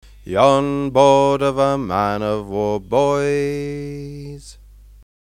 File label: Press gang refrain bass harmony sung; singing histories